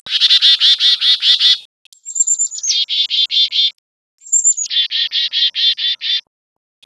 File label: chickadee to pygmy owl, bird songs of WA calls